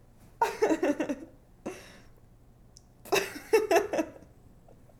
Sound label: Girl laugh